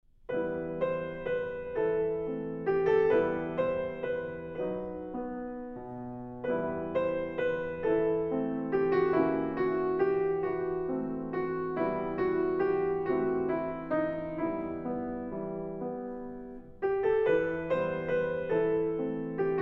Accompaniment

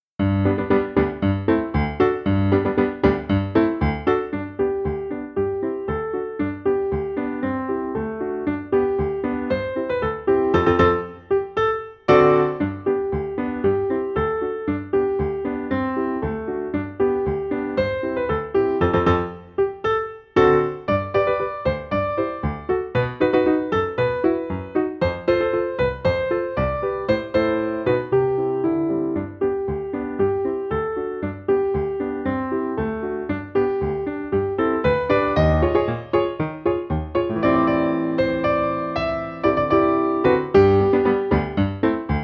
Accompaniment

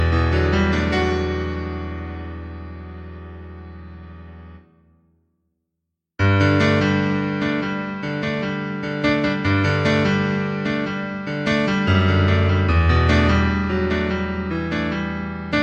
cellos

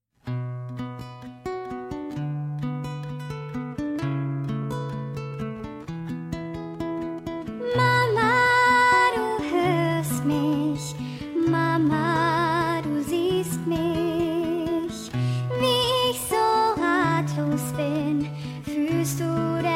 German Vocals

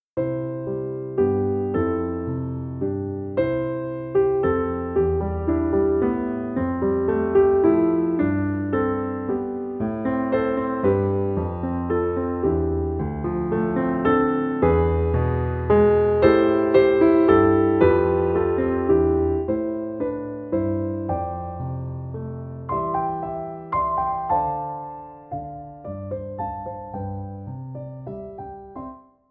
Vocalise Accompaniment

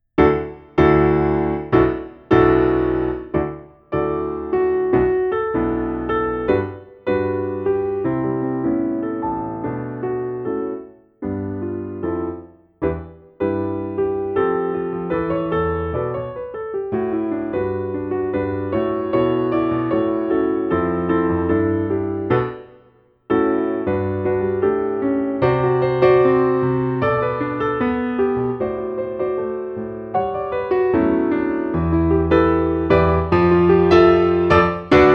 Vocalise High Accompaniment

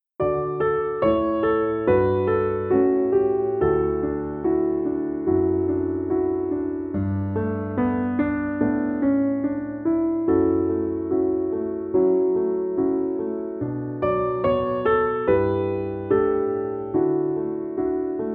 Preliminary Vocalise, Accompaniment

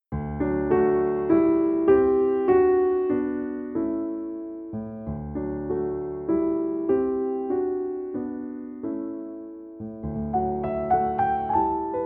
Accompaniment

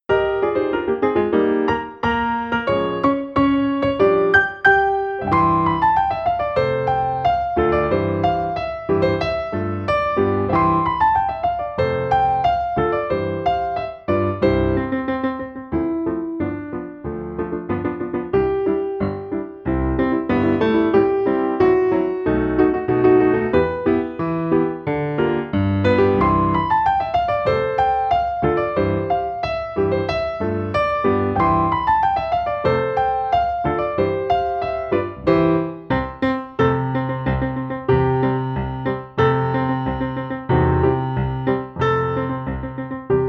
Accompaniment

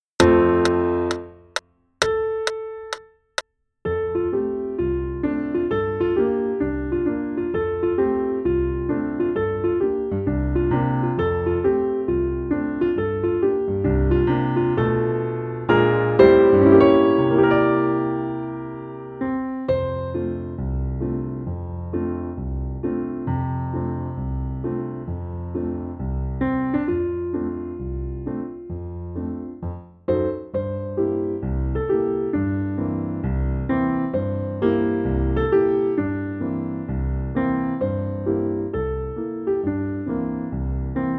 Accompaniment

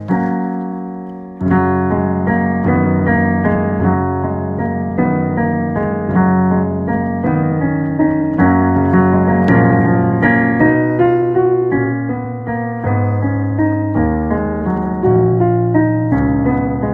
Sing Legato accompaniment

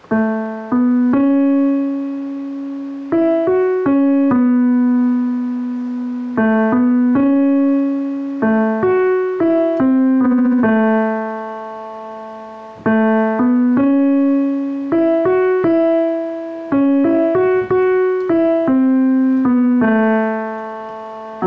piano new key

